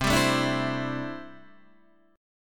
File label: C 6th Add 9th C down Guitar DADGAD